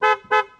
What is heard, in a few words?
Ambience Car Horn; Subway Surfers City Sound Effects; Android